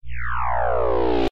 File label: Beam ready